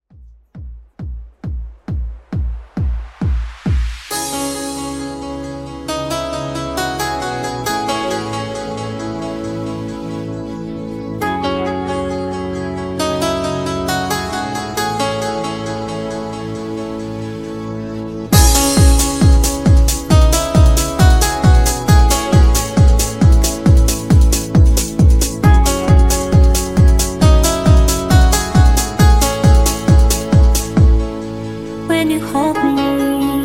Mashup; Remixfreakz

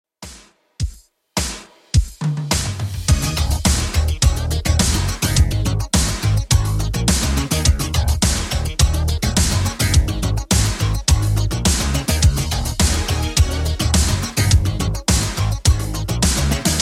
80s 105bpm; Redrum

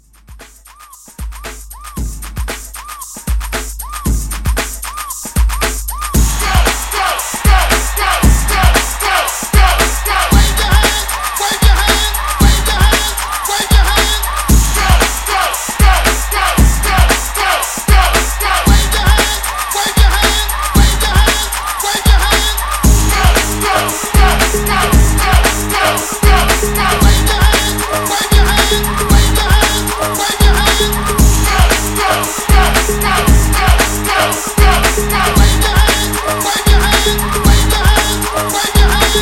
Party Break Reboot

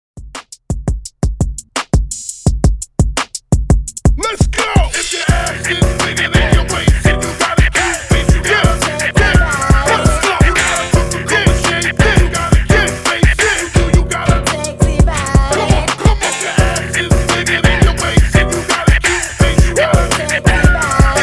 PARTY BREAK, 85 BPM

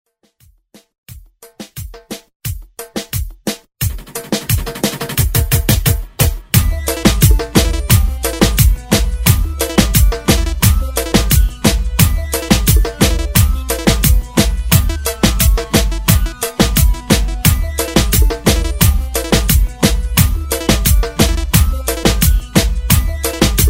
Reggaeton Party Break

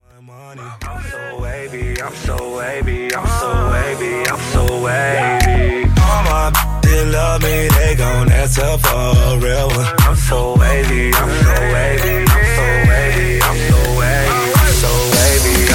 Twerk; Remixfreakz

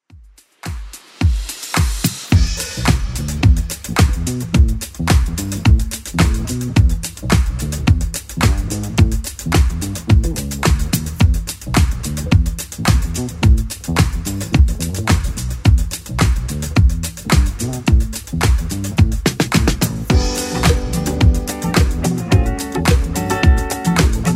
80s Club Edit 108bpm

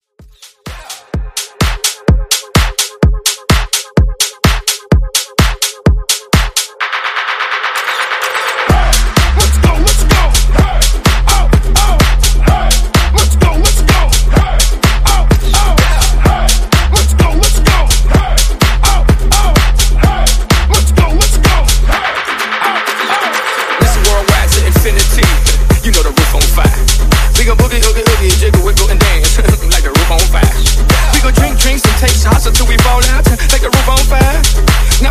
Bass House Hype Mashup Banger

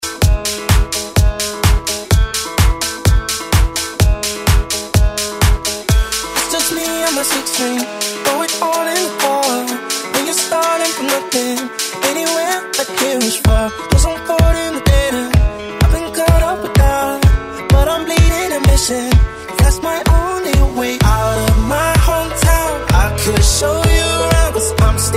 Club Edit

